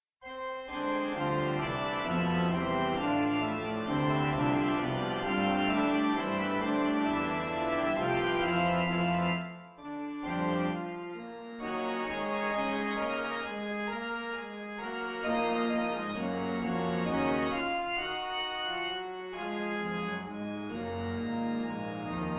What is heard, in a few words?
FANFARE for ORGAN